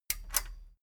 metal button stereo click disable medium

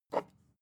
glass bottle pick up short

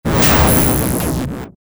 noise burst

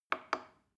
plastic button small click soft slow

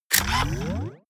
turn on off

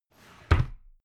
drawer cabinet carton close heavy halfway hectic

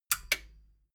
metal button stereo click power off

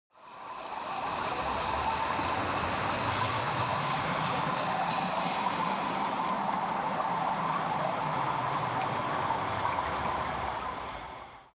water fx reso

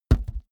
balloon hit hand

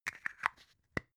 plastic spray can small close clatter

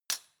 cutlery plastic drawer place

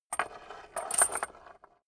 metal scrape on wood jingle

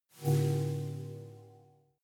sci-fi critical bell clean

